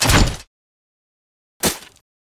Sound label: emote death; leona skin04